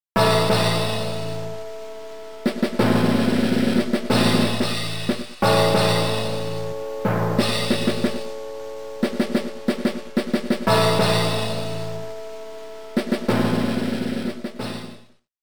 battle fanfare; Advance Wars 1 2; GBA

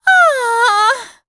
Female Fight Death; mighty party 2017 gamerip pc ios android

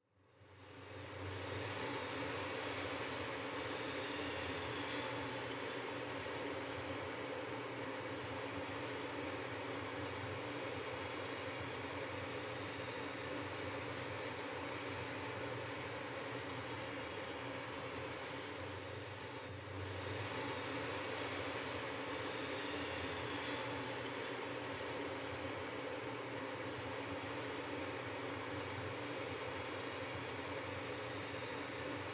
nightmare violin; Syupro-DX; Android

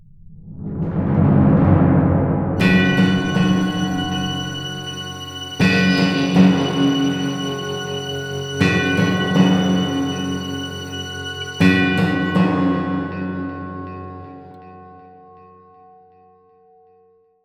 Stinger After Action; Call of Juarez Bound in Blood

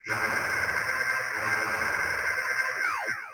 Risen scrap electro proje

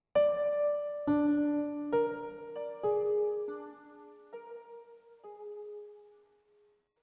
One shot Piano

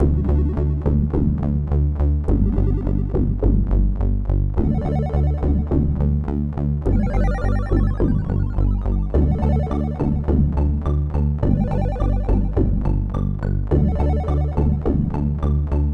8BIT; lego the lord of the rings 2012 gamerip wii ps3 x360

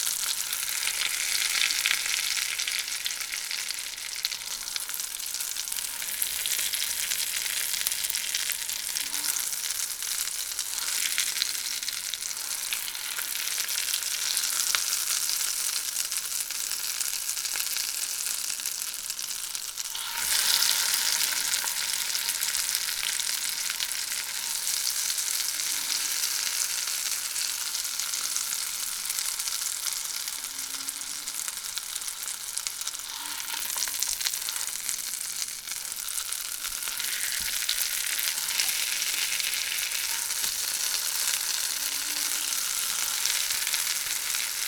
frying meat looping

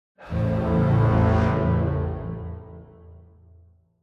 Music Theme Demon Single

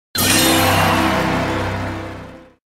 BGM Sting Complete; Family Guy Back to the Multiverse

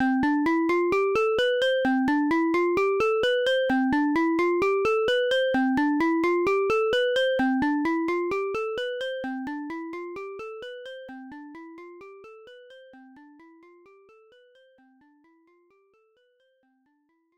Stereo Test; Boxy Boy; Namco System 1; arcade

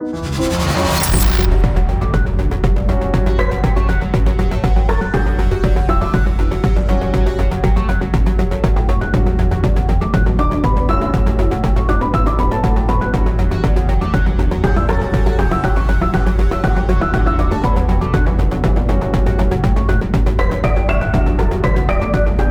Dining Hall Jazz; void bastards 2019

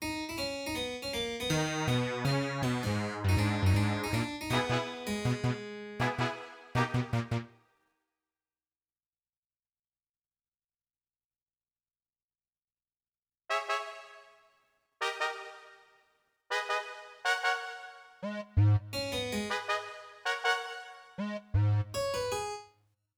Fight Melody

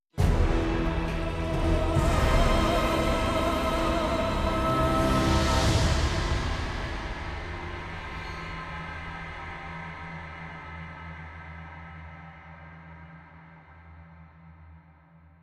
Boss Fight Death; Troy Musou; PS3

